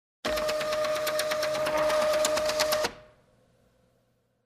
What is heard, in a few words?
Printer out of paper